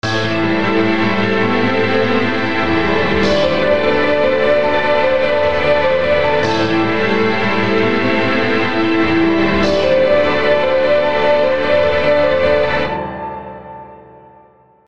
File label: Chorus And Piano